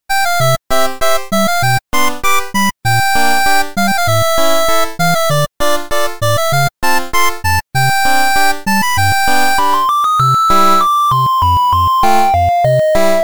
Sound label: 8bit nigiwai machikado Loop